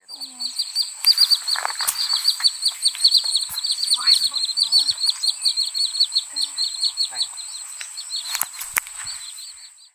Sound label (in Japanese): クサシギ 鳴き声; 鹿児島県大島郡龍郷町; bird song